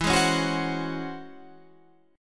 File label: C sharp Minor Major 11th E, chord